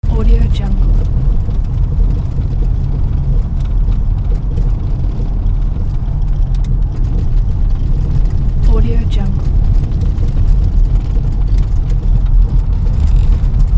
car on bumpy country road

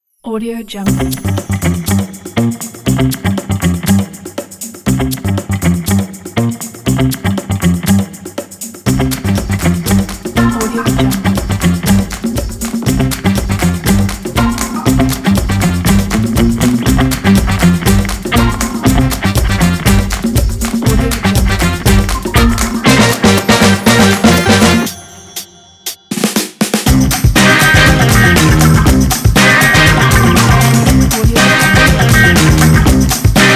funk groove; tape-machine

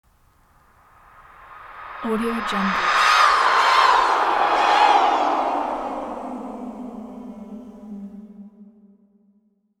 female vocal variation

